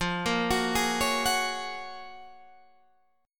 F sharp Major 9th

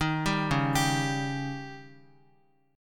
C sharp Suspended 2nd Flat 5th, Guitar; arpeggiated; x,x,3,0,1,3; 4F,4A,5C,5G,5C,6E

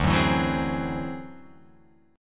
D 9th Flat 5th D down Guitar Open F; strummed; JGuitar

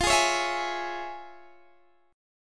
F Minor Major 7th Sharp 5th, F, Guitar, Open D

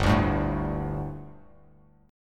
G Suspended 2nd G, down; Gsus2 strummed; JGuitar

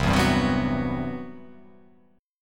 C Major Sharp 11th C down; strummed; CM#11

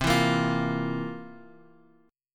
C 11th C down Guitar Half Step Down

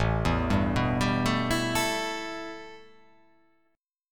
A 7th Suspended 2nd

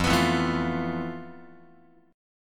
F Major 7th Flat 5th, Guitar; FM7b5; x,1,2,2,1,4; 4A,4E,5A,5D,5G#,6C#; strummed

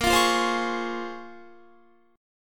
Bb Minor 9th Bb, Guitar; Bbm9 strummed

